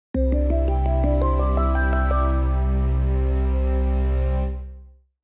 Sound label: Cinematic uplifiting logo intro